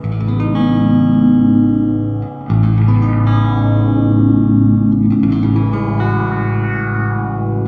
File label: detuned guitar; guitar loops; goldmine